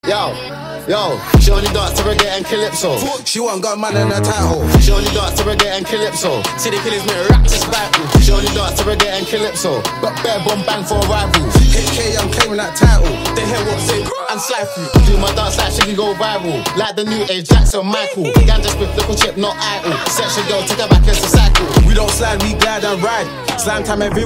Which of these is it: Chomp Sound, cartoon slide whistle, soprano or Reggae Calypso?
Reggae Calypso